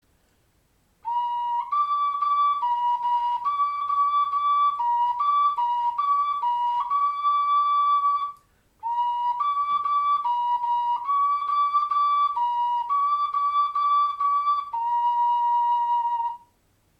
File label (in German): Die Floete klingt, Pentatonische Floete; Die Flöte klingt (Zweitonlied); Pentatonische Flötenlieder